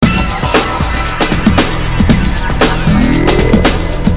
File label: depressing rock music